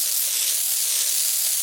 Spray Oil Slick Squirt Loop; SFX Kit; Foley - Misc

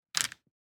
DICE on Felt, Grab, Standard, 6 Six Dice; Samples; ArticulatedSounds; DICE Sfx Party (Extended)

